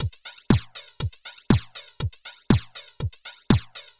House Drum Flanger

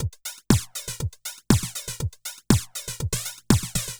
House Drum Flanger

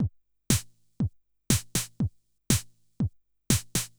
House Drum NoHH

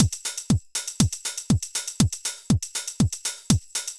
House Drum NoSD

